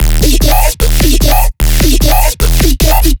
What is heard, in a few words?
bass loop 150 D#; Virtual Riot